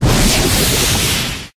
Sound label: rocket tail start; smite; vulcan